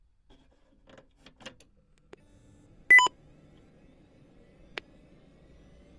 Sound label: nec pc9821xc13 s5a2